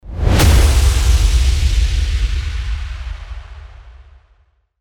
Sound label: IMPACT